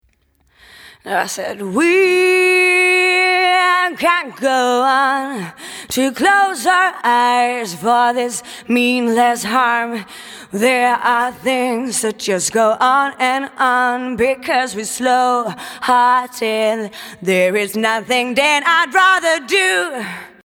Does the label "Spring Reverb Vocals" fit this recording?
Yes